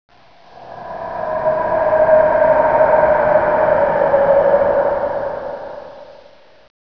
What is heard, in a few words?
cave